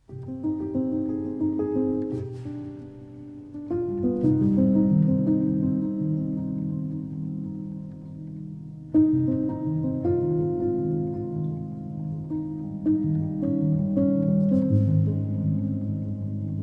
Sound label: Prelude in C Minor for Piano, Violin, Cello; Op. 28, No. 20